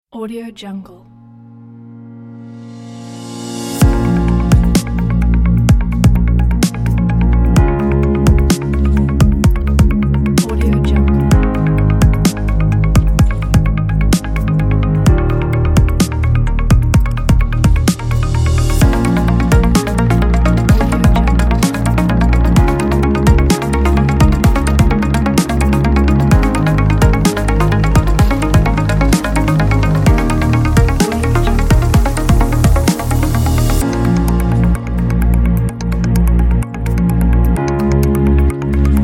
technological

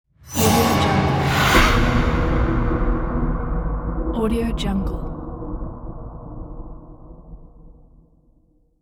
horror jumpscare; sviridpavlov

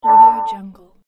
soft ethereal video game browse menu sound

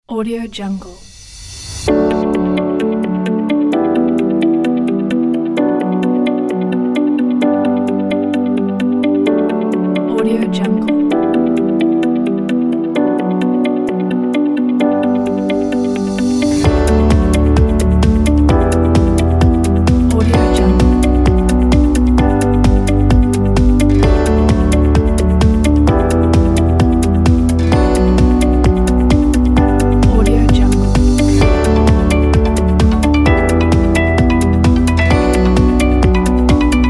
upbeat

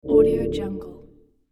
soft smooth ambient video game exit menu sound

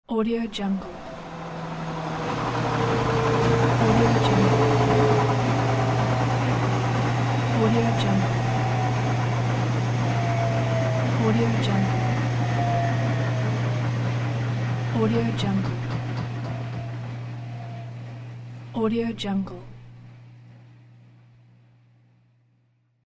washing machine stopping

